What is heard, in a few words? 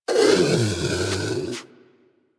Predator Snore, Hunting Critters; Warframe